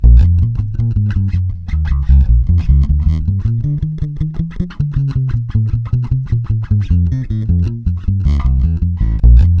BLUES, Bass, 156 BPM; Sampleheads; Ultra Freakin' Bass

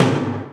Korg N5 Rock Kit C#3

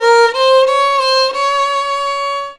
VIOLON B, Indian Traditions

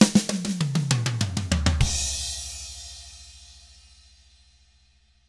shuffled, Drums; ILIO; Double Platinum Drums 1

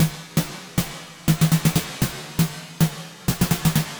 Snare loop (120BPM); musicradar

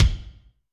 Kik Chunky RM, Drum Machines Alesis D4

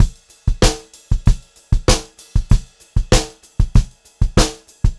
POP VARI, Pop-Rock Drumloops

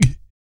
BASS BOMB; Spectrasonics Vocal Planet

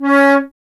flute C#; Miroslav Vitous - Solo Instruments VOL-2; Solo lnst. II

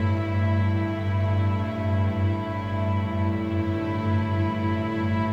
Pad, Orchestral; Sonic Foundry (Sony Creative Software); Orchestral VOL-2; Modern 25

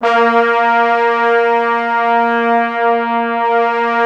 A#2, Orchestral Brass; Best Service ProSamples vol.20; AKAI

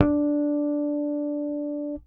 Harmonic One Shot D, Bass; Bass X

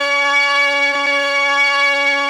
organ F, PIPE ORGAN; Retro Sampler

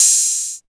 OP HAT

